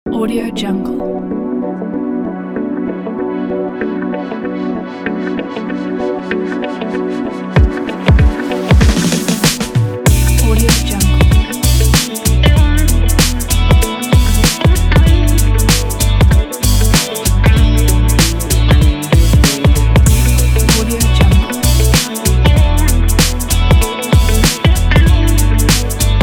chill beat

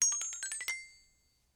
xylophone short; prosoundfx

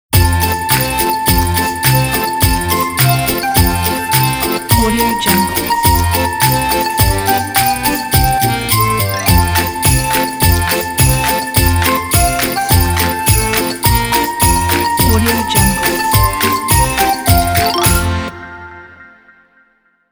cheerful santa ident

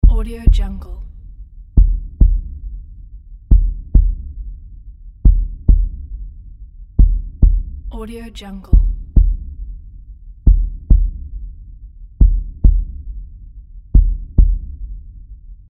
heart beat

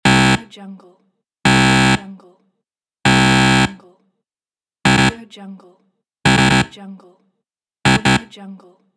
wrong answer buzzer; rawaudiolab

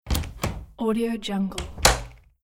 door open and closed

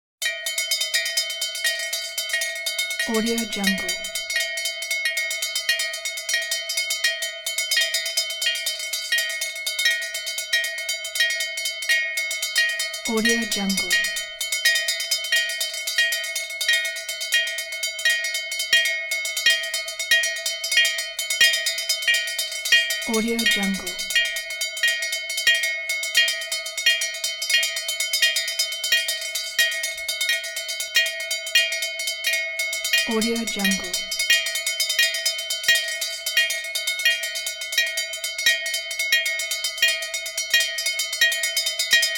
train crossing bell

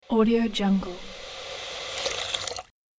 summoner of water magic

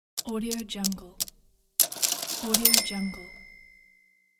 cash register old electric purchase

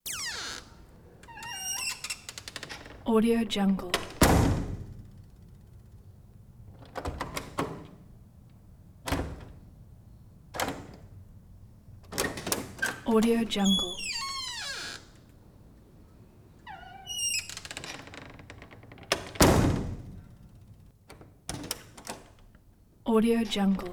door wood and glass classroom

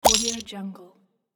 take coins

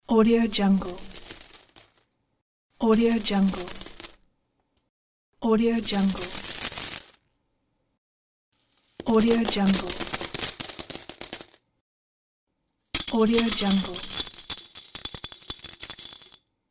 falling coins

